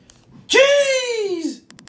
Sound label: drums stinger